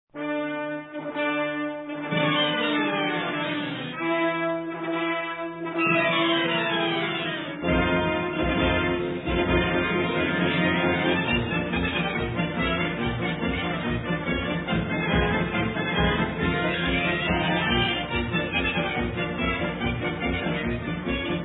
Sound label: Circus